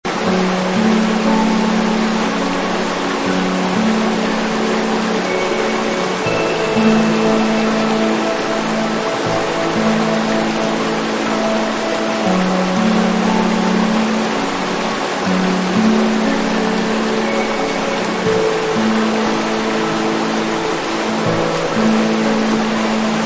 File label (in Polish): himalajski potok